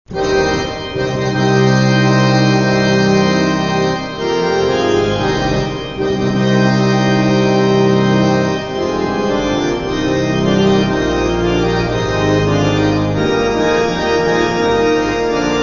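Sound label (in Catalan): organ, instruments de vent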